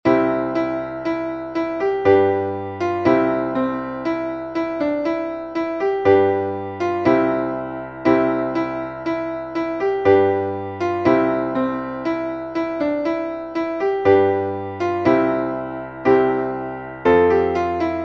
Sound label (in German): klavier engel auf den feldern singen